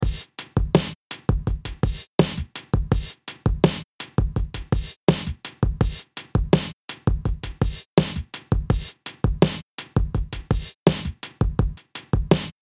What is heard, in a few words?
Vintage Machines Boom Bap